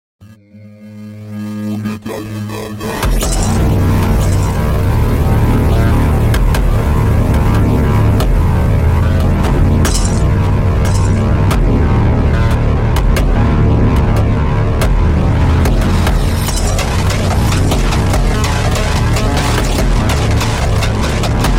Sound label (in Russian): фонк deep flanger echo